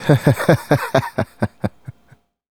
Male Laugh, Hip-Hop and RnB Vocals

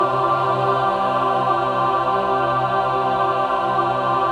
SUS C4, SUS.CHORD, Symphony of Voices

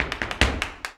Flamenco, ZAPATEADOSOL

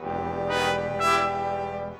Brass; Sonic Foundry (Sony Creative Software); Orchestral VOL-2; Modern 35

